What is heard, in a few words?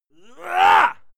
male fight rah; schreie; menschen; soundpool